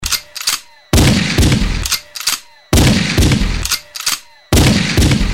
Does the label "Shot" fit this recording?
Yes